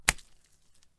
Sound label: Wet Slap